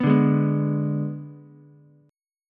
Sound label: G sharp Diminished G sharp, down, Ukulele; strummed